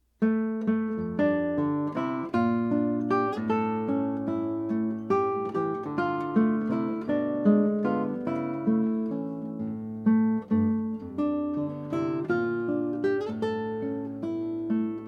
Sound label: Guitar